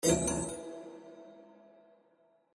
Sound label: spin start; Champions of Valhalla